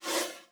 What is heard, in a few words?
Soft Slide Short